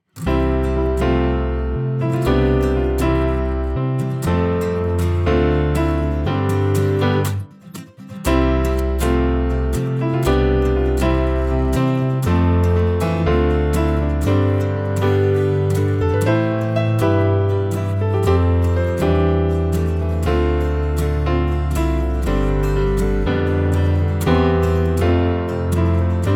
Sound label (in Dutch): Heilig heilig instrumentaal; kyriale